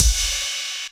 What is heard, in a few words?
Kick